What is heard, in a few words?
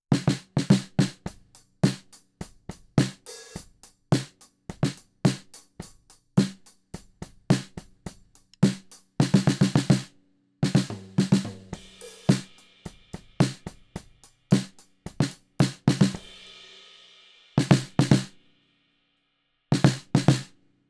DPA 4099 Snare Drum close and Kit